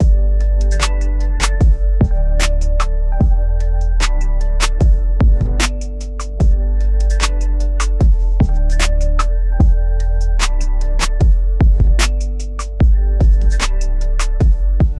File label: smooth lo fi hip hop beat